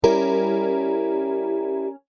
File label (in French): guitare